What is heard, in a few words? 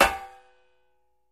Metal Hit Ring Soft, small impacts